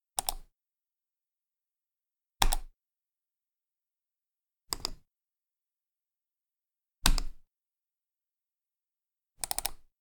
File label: Mouse Click